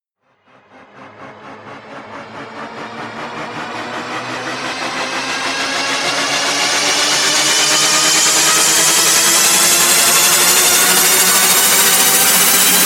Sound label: risers screaming pain